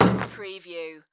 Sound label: Basketball Rim Hit; sound effects bible